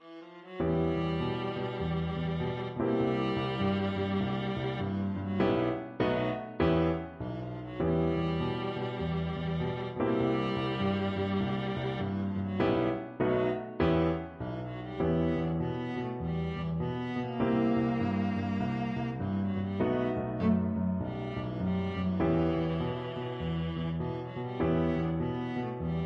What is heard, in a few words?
minor mooch, viola